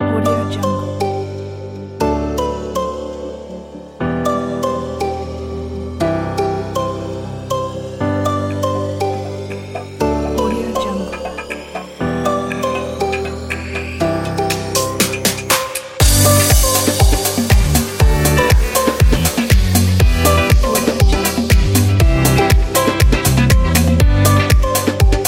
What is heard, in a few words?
pop background music